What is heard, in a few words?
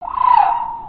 kakapo quarter speed